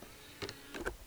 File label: disc clamp, BDR-S11J-X